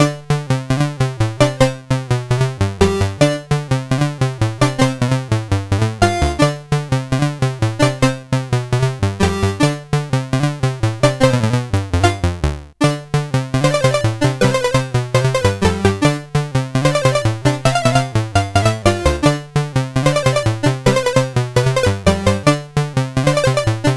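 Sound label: Pocket Rockets, MOS 8580 SID chip music, games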